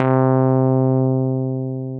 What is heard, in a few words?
Synthesizer Middle C Notes, Lead